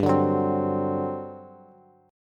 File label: G Major 7th Suspended 4th, Bass; strummed